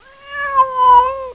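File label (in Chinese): mao jiaosheng, cat meowing; jiachu jiaosheng; jiaqing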